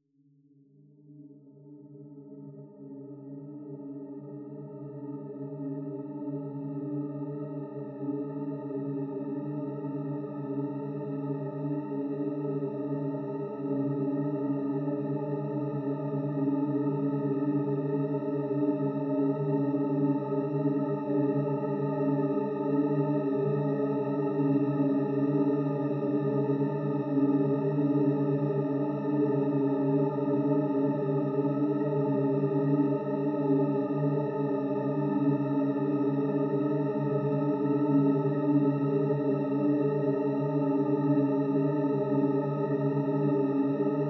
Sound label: DARK D Db cluster chord 76 BPM